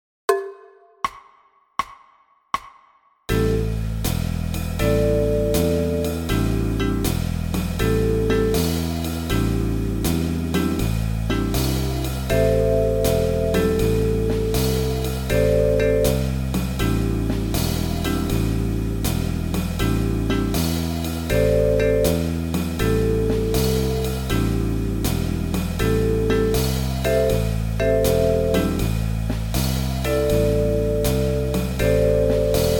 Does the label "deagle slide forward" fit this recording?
No